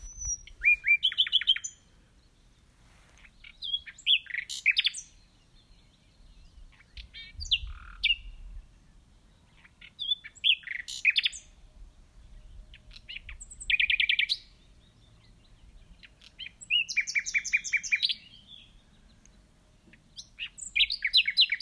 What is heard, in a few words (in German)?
Vogelgezwitscher Maifeld Radweg